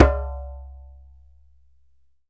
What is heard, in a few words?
DJEMBE, African Drum